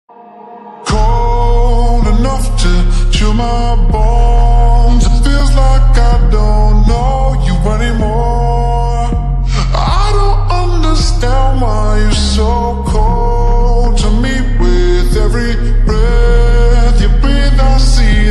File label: slowed reverb